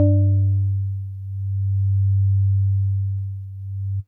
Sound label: House Organ DX100 G2